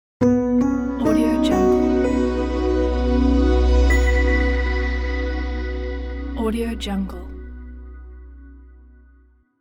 clean logo

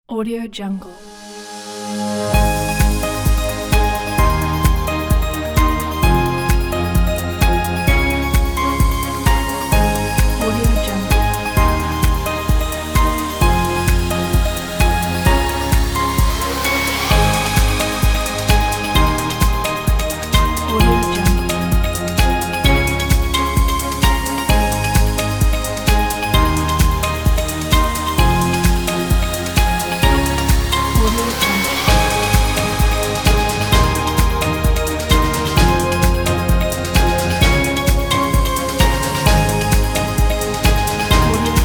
christmas corporate; smartsounds

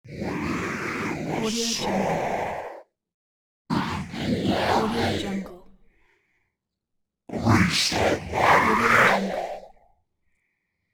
devil

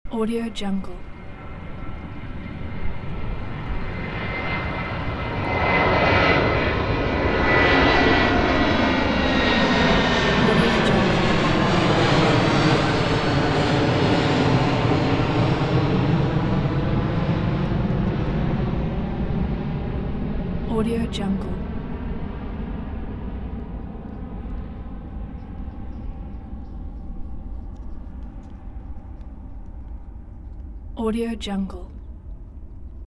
airplane pass by

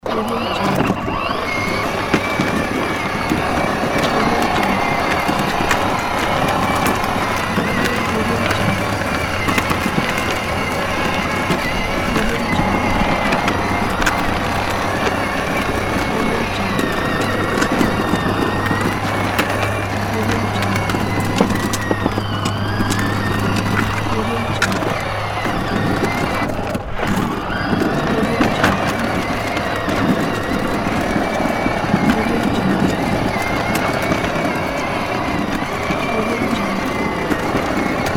electric toy car driving close

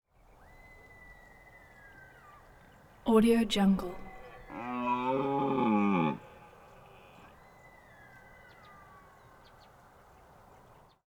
red deer stag single call stress frustration

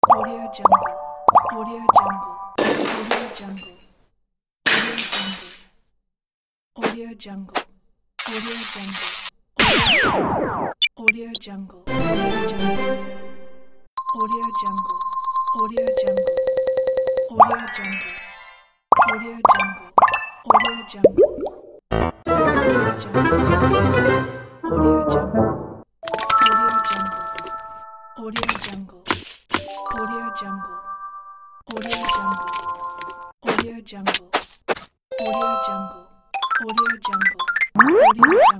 match 3 game pack

